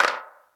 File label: verby clap single hit d sharp key; single hits one shots